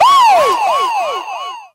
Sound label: ow